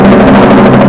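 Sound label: shuffle sound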